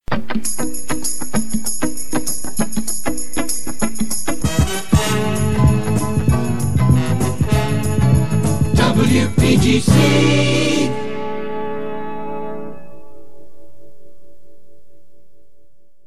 jingles fm id full mix; drake double a image pack remix

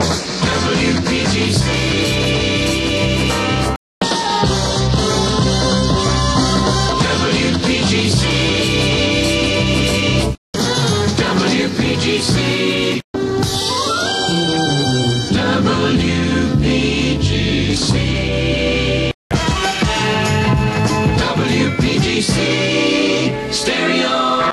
jingles image pak; drake; aa image pak

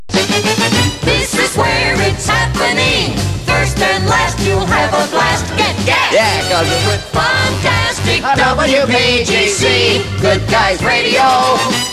jingles; 1966; spot productions; funtastic first and last

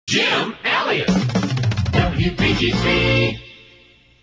jingles; positron

